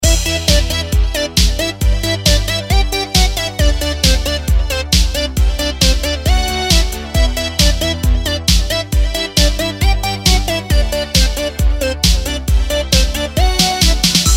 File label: Vocoder Love